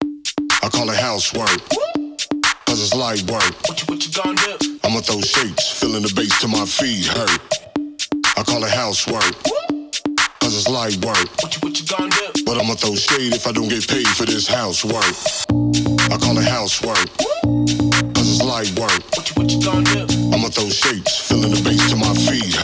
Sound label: Sapphire Jazz. House Work